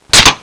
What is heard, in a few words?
pop can